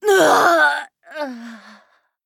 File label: Death